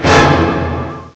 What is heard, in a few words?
ORCHE; CMI Sound Library Rev 1.3; STRINGS